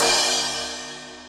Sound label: drum crash